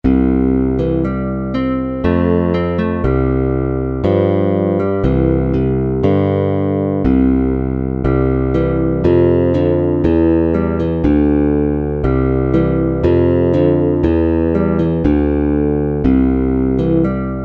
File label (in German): gitarre